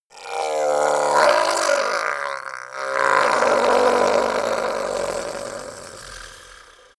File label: goblin groan painful overdramatic